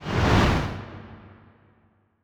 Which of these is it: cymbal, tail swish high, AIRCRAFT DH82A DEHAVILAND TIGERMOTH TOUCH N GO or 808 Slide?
tail swish high